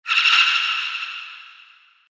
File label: Vampire Fright; horror; Monster Sound Effects